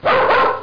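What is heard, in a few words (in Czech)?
PES, dog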